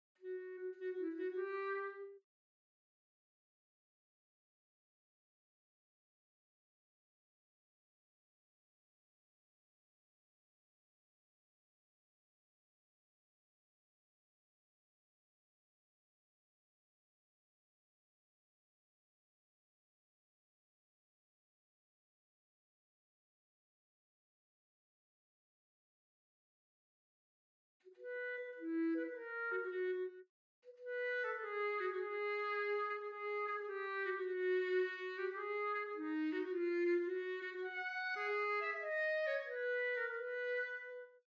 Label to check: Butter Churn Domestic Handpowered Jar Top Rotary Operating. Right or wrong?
Wrong